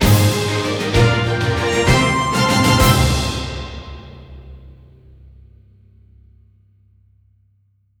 goal Line Sequence; netent

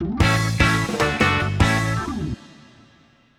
Mid Win; WildWater

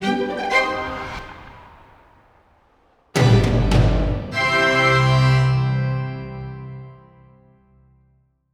Pick And Click Outro; netent

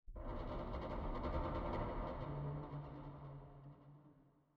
ambience sound; netent

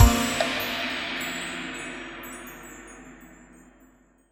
big win end; netent

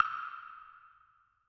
change level; netent

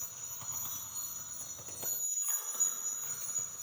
shimmer Loop; netent

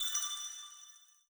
count Up Loop End; netent

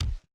reel bounce

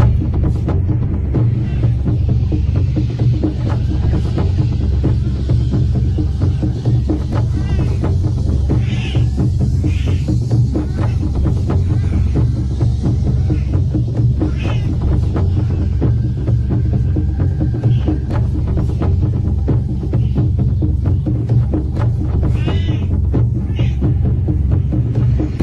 dice roll drums loop; netent

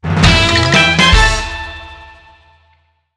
Win Medium; netent

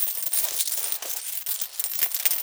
Coin Count Loop; netent

